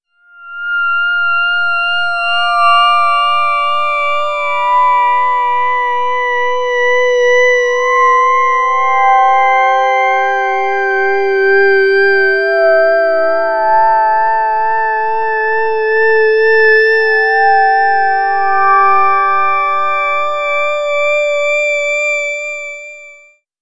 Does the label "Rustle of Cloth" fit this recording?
No